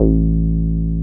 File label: 303 SQR A2 P, SQUARE BS